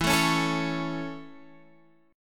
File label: C sharp Minor Sharp 5th E, chord